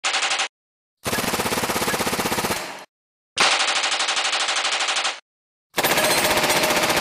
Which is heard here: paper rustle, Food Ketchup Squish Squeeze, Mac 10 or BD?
Mac 10